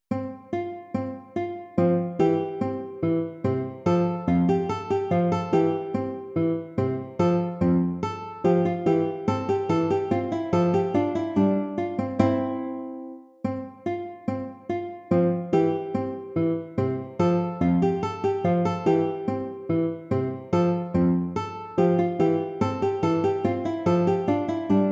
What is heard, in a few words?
gavotte; corelli arcangelo